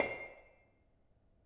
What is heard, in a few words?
Bonang Panerus, gamelan